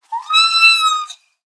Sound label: Kitten Meow; SES Animals SFX